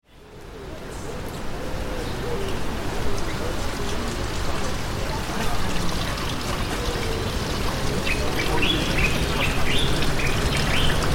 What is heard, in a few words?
Jardin Majorelle soundscape